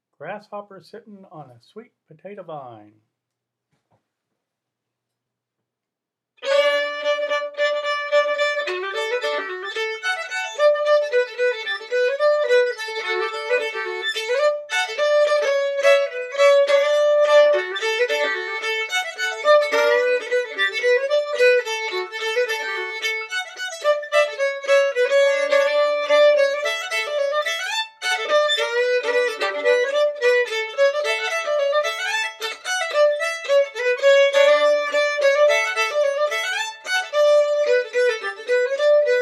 fiddle; festival tunes

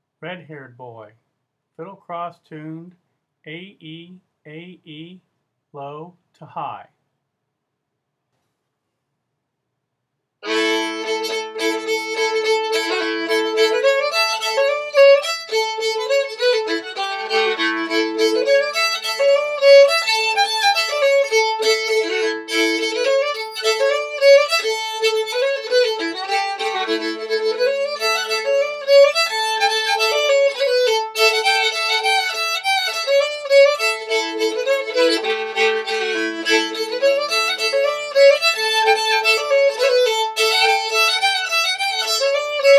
fiddle; festivaltunes

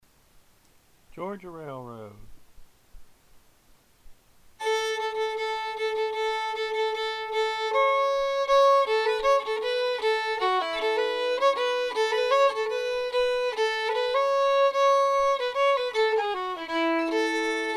fiddle; festival tunes

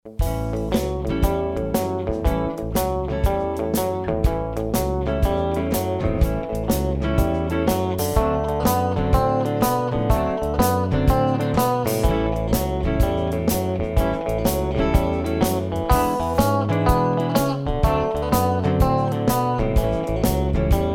Classic Rock Boogie; Classic Rock Boogie 1

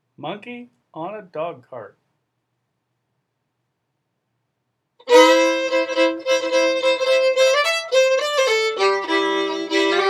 fiddle; festivaltunes

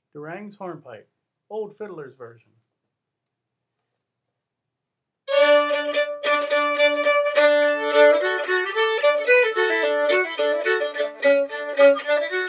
fiddle; festival tunes

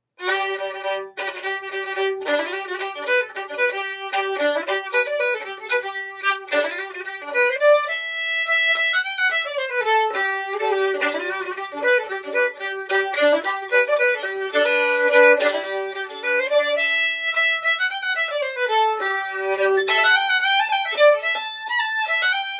fiddle; festival tunes

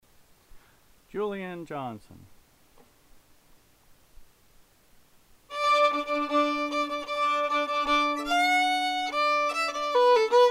fiddle; festival tunes

